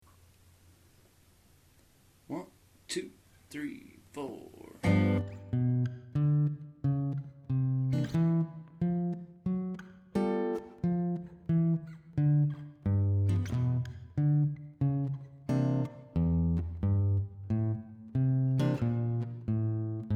Backing (G); ABGU Almost Alone Solo #3

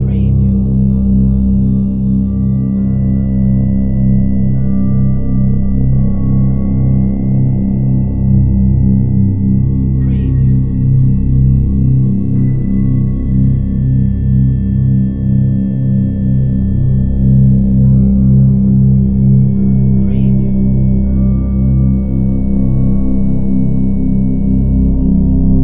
dark ambient

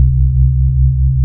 moon bass

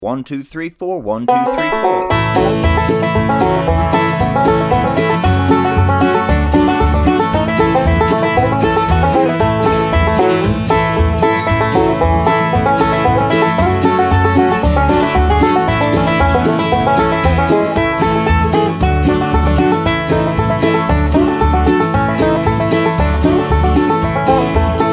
Banjo 115 BPM; Cherokee Shuffle Intermediate

